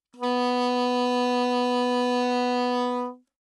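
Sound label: Soprano Saxophone vibrato ff B4; Woodwinds; Bb Soprano Saxophone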